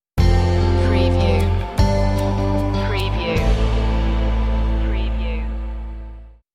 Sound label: time after stinger, romantic